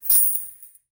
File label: quick swing of chainmail; armor